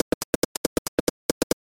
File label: Drums